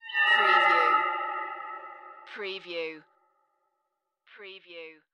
Ghost Cry

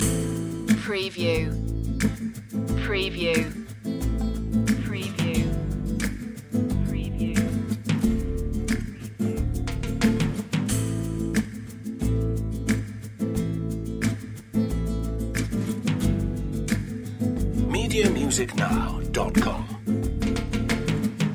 spanish storm loop

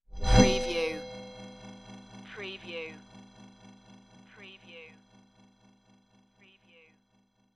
magic power-up transformation

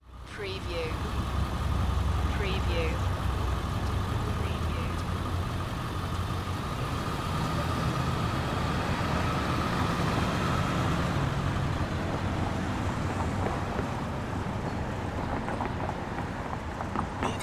City Downtown Small; sound effects bible

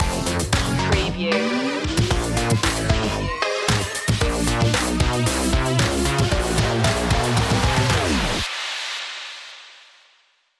funhouse 84 stinger

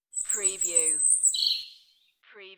UK Birds; beanstalk audio

